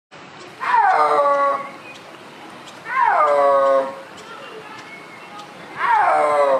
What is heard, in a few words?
snow leopard; پلنگ برفی